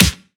clicky snare single hit f sharp key

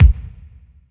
roomy steel kick drum sample d key

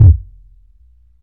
boom steel kick drum sample E key; kick drum samples

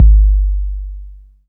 wet kickdrum sample g key

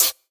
original shaker d key

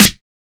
old school verby snare one shot g key

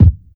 puffy bass drum single shot e key; kick drum samples

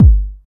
kick drum one shot G sharp key

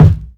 clear kick single hit f key; kick drum samples

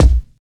sharp bass drum single hit b key

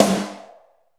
strong bottom end steel snare drum sound g key

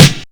good steel snare drum e key

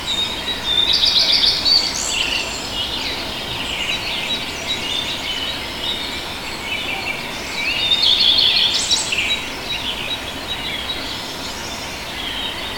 early mountain birds habitat 3; soundscapes

